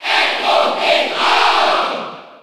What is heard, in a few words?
Cheer French; R.O.B.; SSB4